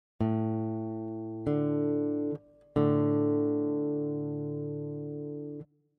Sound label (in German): Intervalle Quarte